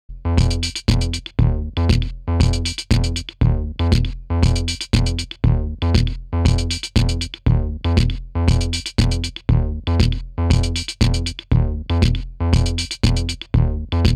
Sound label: Rupert Neve Designs Portico 5017 Amp SM 57